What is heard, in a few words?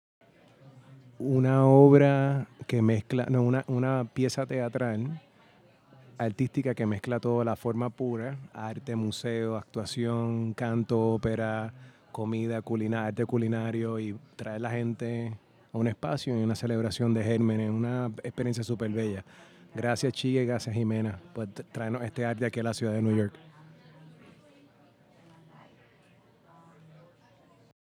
Audience Recording; a-meal-premiere